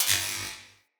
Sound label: Shock Projectile Zap